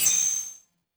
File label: discovery; orbit